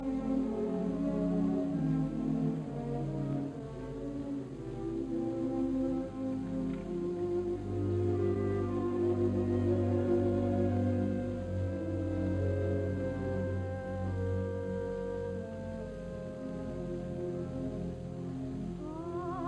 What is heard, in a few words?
Coloratura Soprano Concerto Andante; Gliere; Op 82